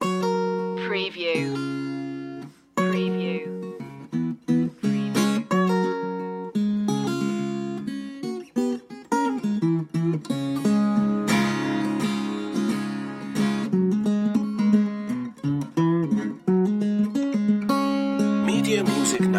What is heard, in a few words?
blues loop